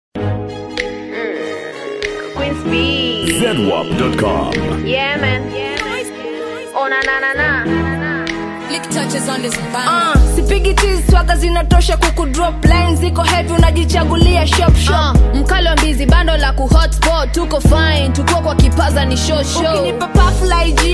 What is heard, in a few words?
rap diary